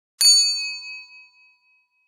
Elevator bell ring tone

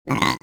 Cartoon burp

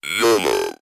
Toy robot talking

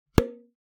Cartoon light impact

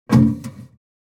Metal trash can lid closing

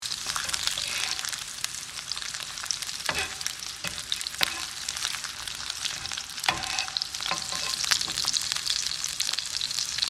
French fries frying sizzle loop; cooking

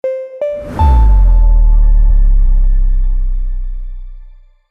Corporate ident effect intro podcast; logo animation

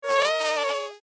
Mosquitoes fly by into ear

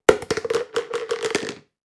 Plastic food container falling impact

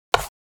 Checkers game sliding piece; leisure

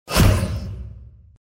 Sphere small evil

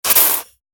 Snake spit venom

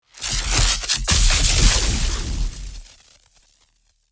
Unleashing raw energy, shockwave

